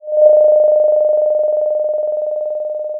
Sound of a phone ringing

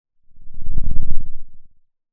Sound of a lion’s roar

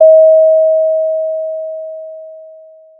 pop, Sound of a doorbell

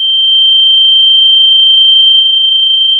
Sound of a tea kettle whistling

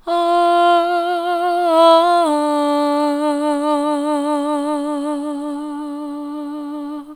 ahh soft, vocal

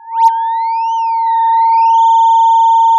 Sound of a police car siren

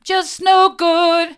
just no good mid, vocal

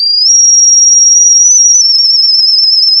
pop, sound of a tea kettle whistling

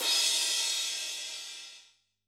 Crash, drum

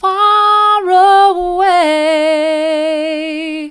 faraway mid, vocal

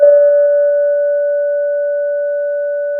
pop, Sound of a church bell

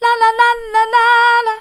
la la mid, vocal

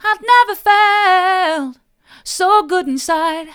never felt so good inside mid, vocal

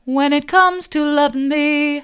when it comes to loving me soft, vocal

